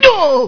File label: hit; BattleSNDS